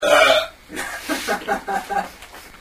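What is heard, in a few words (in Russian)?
ух отрыжка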